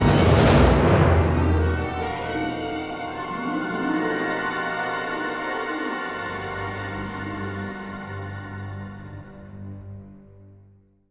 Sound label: battle lose, music